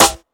natural snare drum C key, acoustic snare sample tuned to the C note. Loudest frequency: 2363Hz